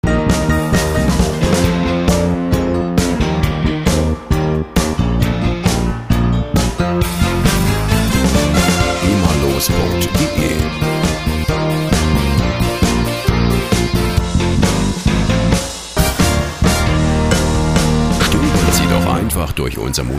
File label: riff